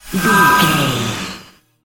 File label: magic shine light spell